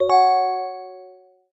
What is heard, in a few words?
shelter money transfer receive; Original TK Sounds